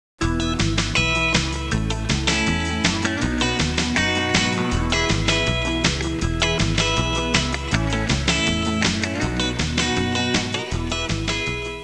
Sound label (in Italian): suonerie blues